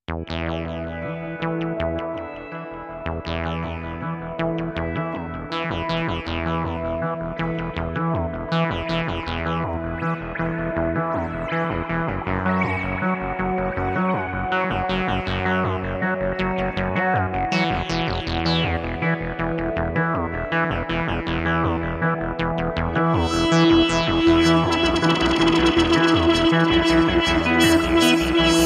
Electro Ambient

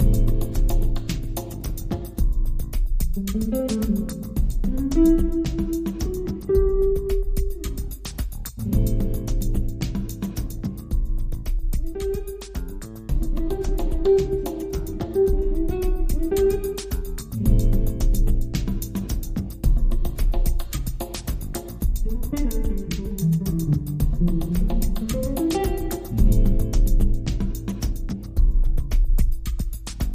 Jazz Conga

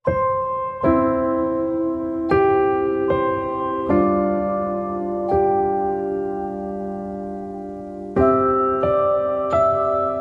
O Come All Ye Faithful, Keys; Trad (PD)

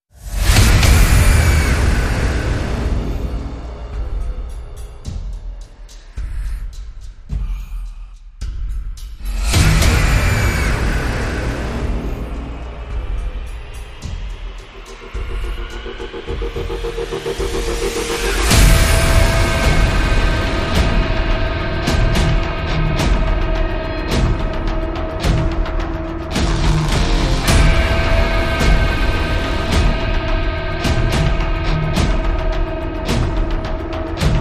Instrumental No Vocals

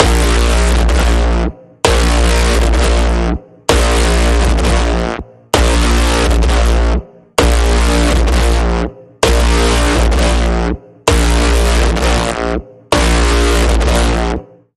Pulsing Code Generator

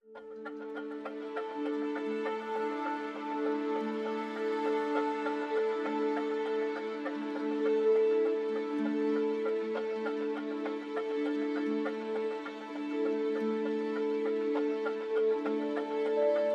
No Piano

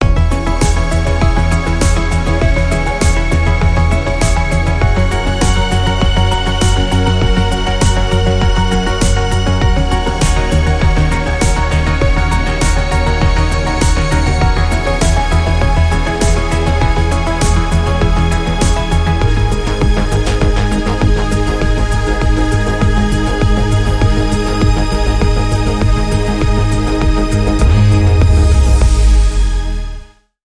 Trance Synthesis Rhythm

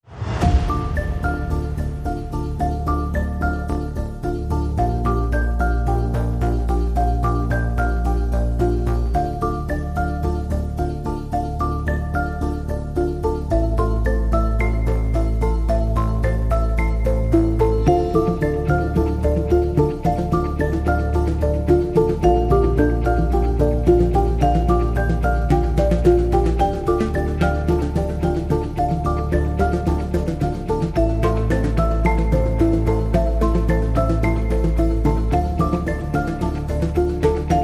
Likeminded Marimbas, No Drums

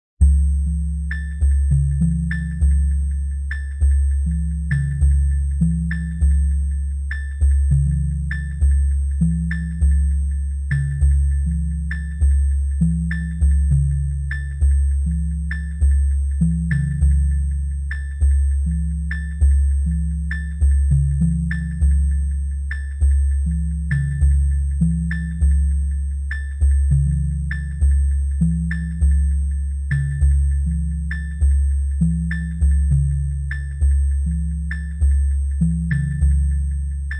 Rhythm Only

